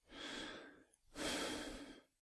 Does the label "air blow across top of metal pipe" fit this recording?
No